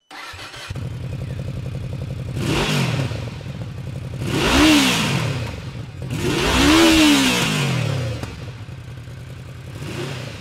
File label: reving, Vmax; 新型VMAX; 排気音; レーシング